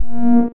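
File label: "hee" female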